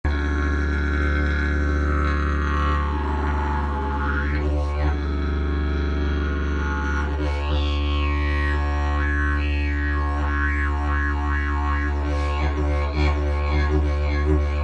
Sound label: didgeridoo; jilm tanecnik